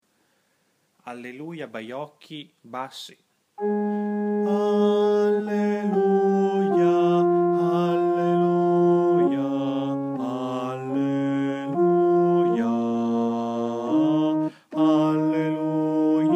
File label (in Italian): BASSI; Alleluia